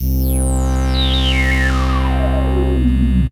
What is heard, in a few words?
JD 990 Bass